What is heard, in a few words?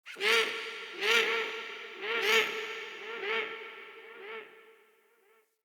night creature large bird; Ambience; Night One Offs